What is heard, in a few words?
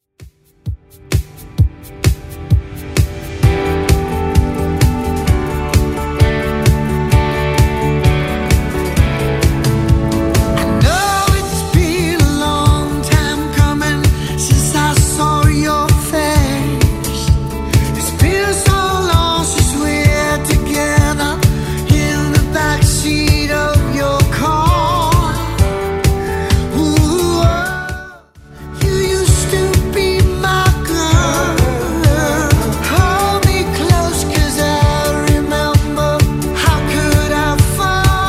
2Ks Redrum 130 bpm